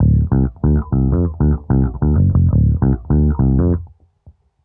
bass; Phonky

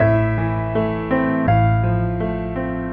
piano, Loops, Sad

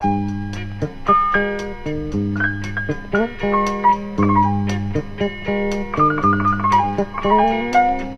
piano; Phonky

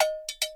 cattle calling; Drum loops

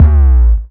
Kicks; Heartbeats

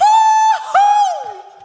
hoo hoo SUNG; ooh ahh; ho hoo woo

